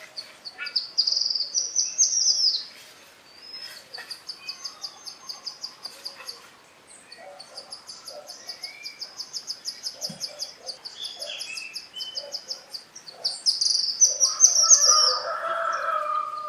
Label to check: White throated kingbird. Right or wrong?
Right